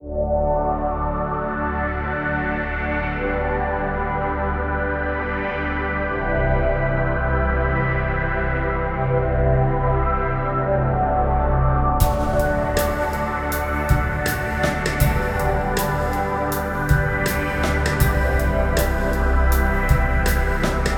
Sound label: arp, 80